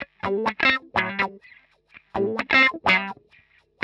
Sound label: 125 wah A8, wahwah; PowerFX - Nu Jazz House